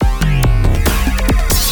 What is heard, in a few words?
Attack Loop 140 Bpm